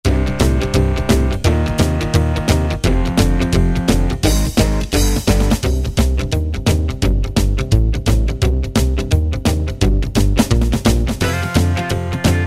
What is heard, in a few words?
Rockabilly; Preset Styles